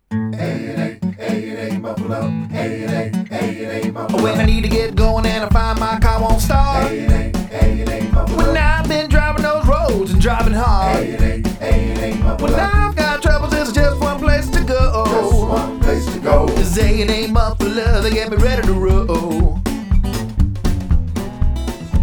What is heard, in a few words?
MUFFLER JINGLE; WKXY; AA MUFFLER